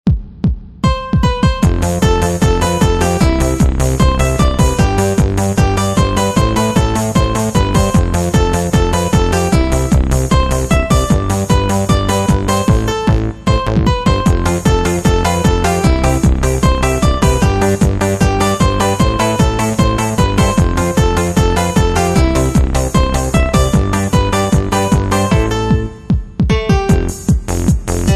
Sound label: minus lofi